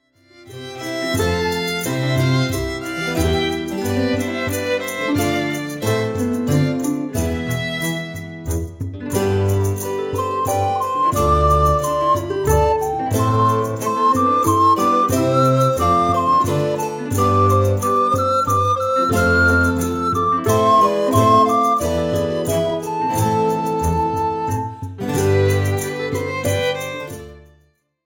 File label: new minuet